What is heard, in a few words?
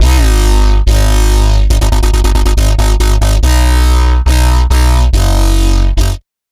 Bassline C3 140, Dubstep; GhostHack